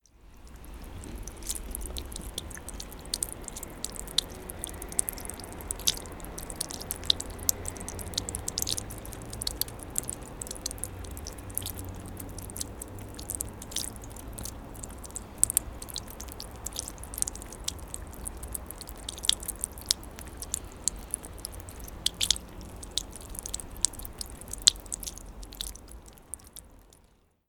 grave water

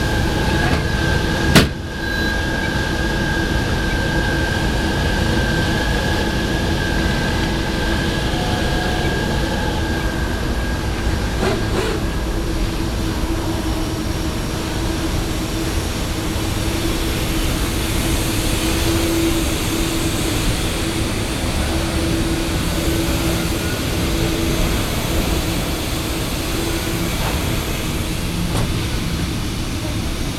Machine

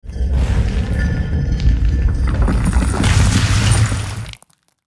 Wall Crushing

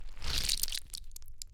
Slime

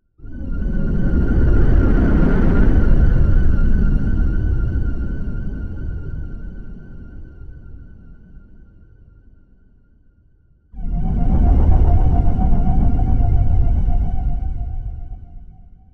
Rumble

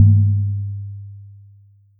Deep Bassy Drum

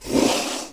Slide Cup

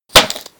Punch

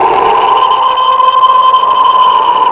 Jump scare

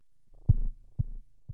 Thud chorus

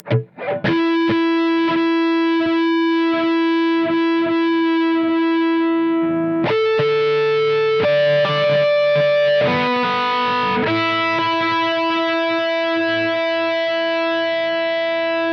Tuning

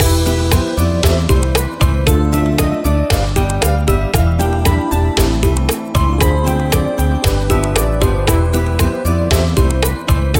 Latin Game Theme Loop

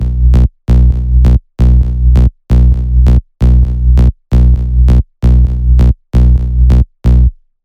Trance Bass

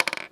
Chess Piece Bounce

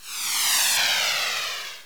Missile

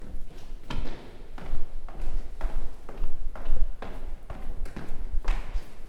Down Stairs Strong Steps

